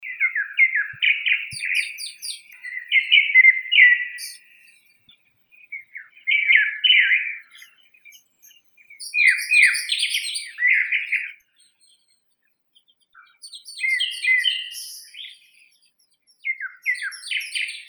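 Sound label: pale thrush turdus pallidus; drozd blednii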